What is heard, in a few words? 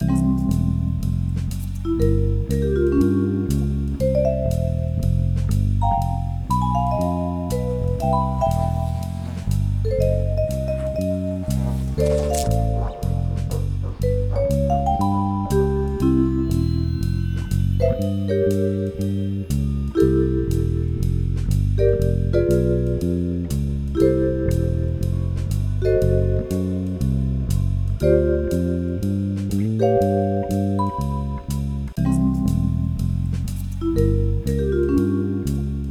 Scoreboard; Split the Room; The Jackbox Party Pack 5; Jackbox Games